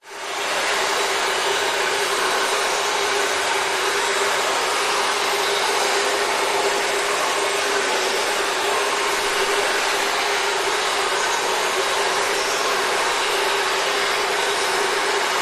nature cave ambience fast heavy water drips running reverb echo